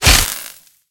fx laser impact

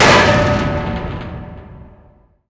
explosion impact metal

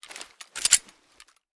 Pistol10mm Equip Up; ARMES

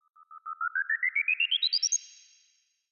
explosion grenade concussion pulse

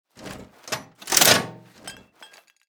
Rifle Laser Gatling Reload Bolt Open

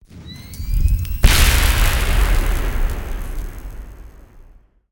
fx mine emp explosion

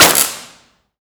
laser beam

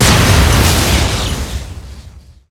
explosion grenade plasma high

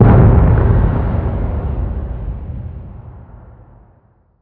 explosion grenade plasma low

